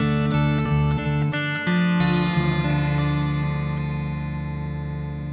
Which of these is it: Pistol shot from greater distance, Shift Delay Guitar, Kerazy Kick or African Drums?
Shift Delay Guitar